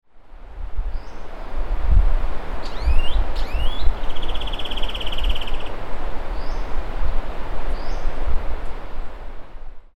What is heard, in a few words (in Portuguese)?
Philydor atricapillus Limpa folha coroado